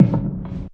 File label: machine dropbass; Weird and Interesting Hits; single hits